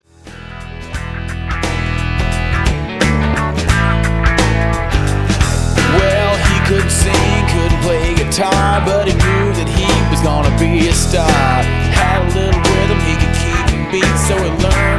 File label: Funky